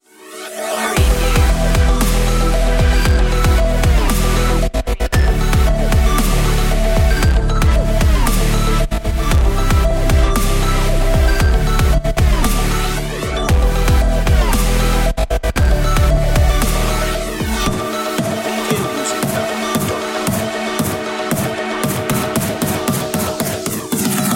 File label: cosmic funk